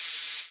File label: trap open high hat sample c key